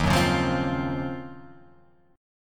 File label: Eb Minor 13th Eb down Guitar Open F; Ebm13 strummed; JGuitar